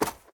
snow run, step; blocks; Minecraft; Remastered

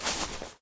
moss step

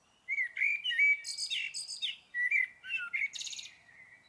bird, ambient

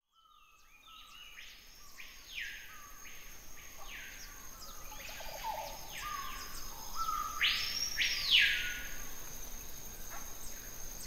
long bird, ambient

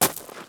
gravel land, step

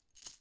chain, step; blocks

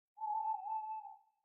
owl; ambient

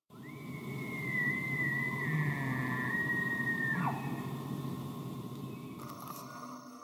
bugle, cervidae

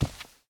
rug walk, step

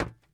metal box run; blocks; step

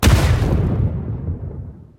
explode

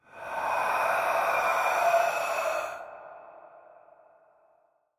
cave, ambient; Minecraft

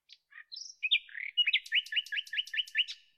chirping, ambient, bird

